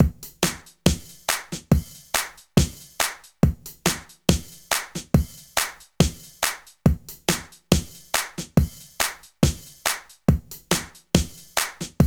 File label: RAGGAE, Funky; INIS; Funky Xtreams